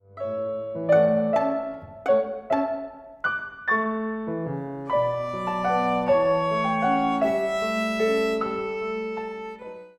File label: VIOLIN